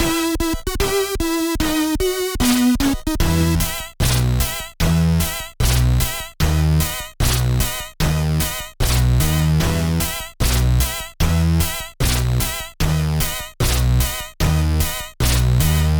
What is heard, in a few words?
music forest intro